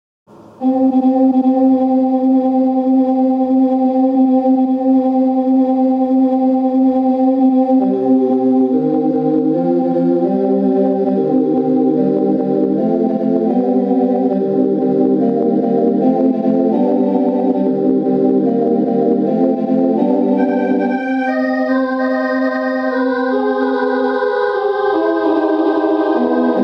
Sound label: Blues